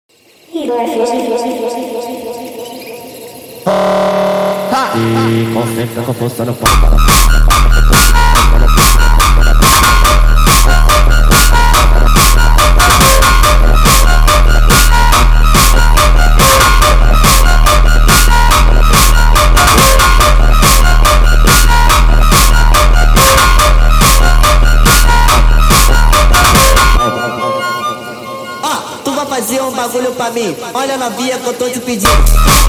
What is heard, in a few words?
sped Up, funk